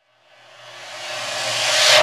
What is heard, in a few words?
Reverse Cymbal; Best Service; House Definition VOL-1; Cymbal Stuff